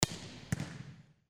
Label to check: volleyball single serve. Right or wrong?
Right